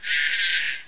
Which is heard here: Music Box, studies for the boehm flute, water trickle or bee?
bee